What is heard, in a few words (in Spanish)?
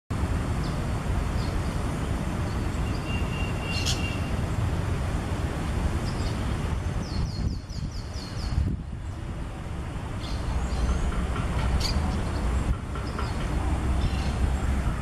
ave forpus passerinus periquito comun